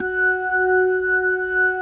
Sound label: F#4S, B3, Vintage Keyboards